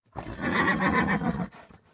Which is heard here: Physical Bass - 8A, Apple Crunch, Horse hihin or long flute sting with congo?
Horse hihin